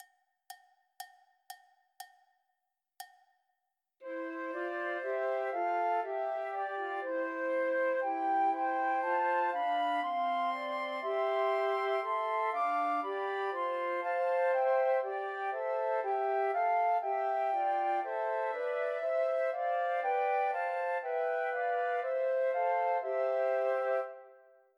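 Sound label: Trios for Three Flutes; Unto Us a Boy is Born; Christmas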